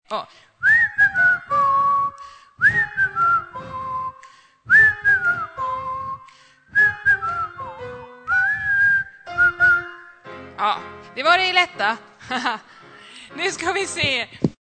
vissel

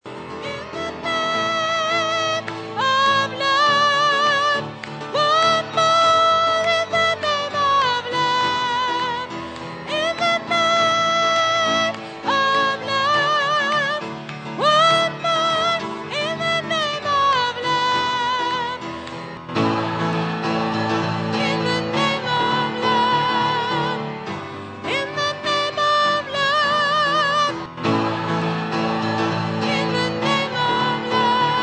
tenor

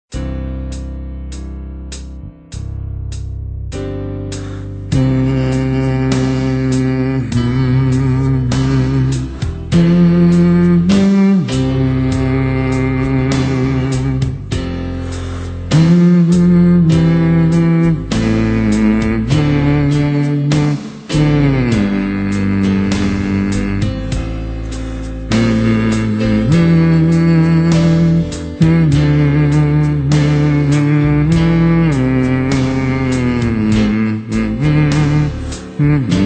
bas, stammor

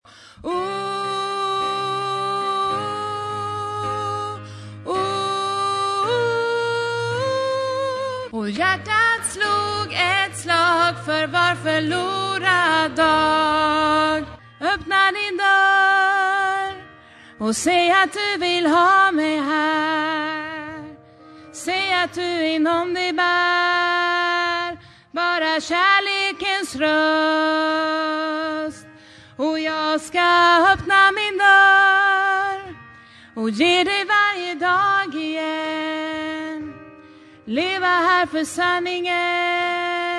öppna din dörr sopran, stammor

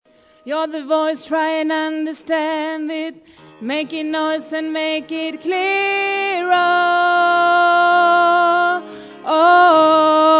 alt, stämmor

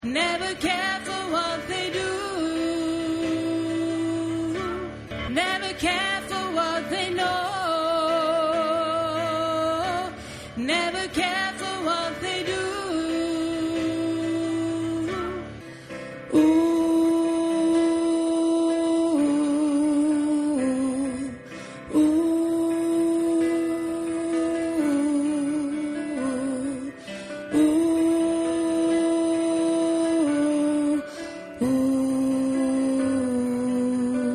alt, stammor

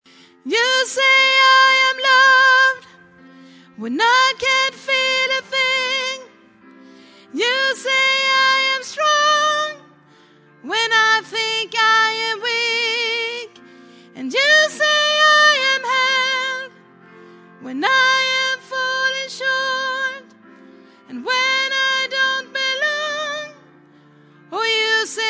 tenor, stämmor

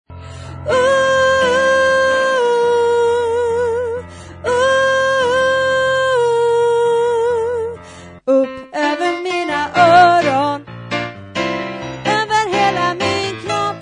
upp over mina oron tenor, stämmor

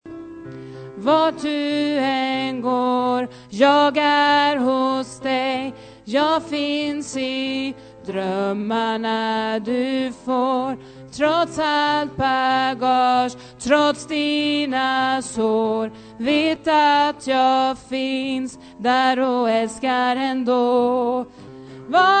vart du an gar alt

